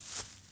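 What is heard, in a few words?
footstep bare grass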